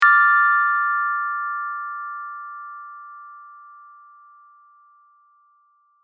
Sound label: High Minor Chord